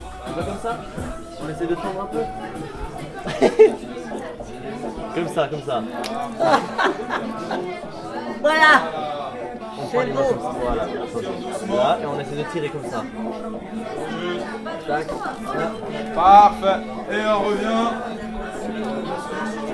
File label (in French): Bon son ambiance